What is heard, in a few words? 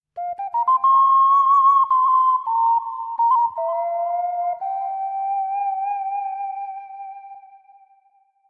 ocarina; anemoi